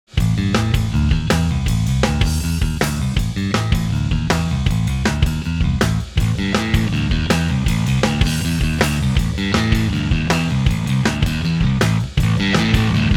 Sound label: Neck and Bridge